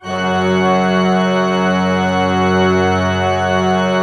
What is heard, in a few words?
F2, Cathedral Organ, PED VOL WERK; Propeller Island